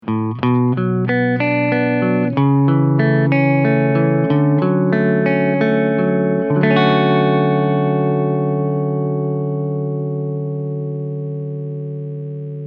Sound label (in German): Gitarre Dry